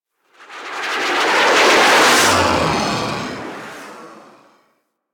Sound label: Grineer Fighter Ship B Close Fly By, Space Fighter Ship Engine; Warframe; Railjack; Enemy Ships